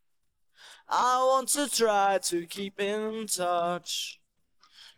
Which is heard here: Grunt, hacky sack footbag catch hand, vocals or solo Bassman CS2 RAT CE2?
vocals